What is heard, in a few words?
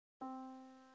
yamaha Disk